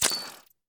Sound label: dark hole charge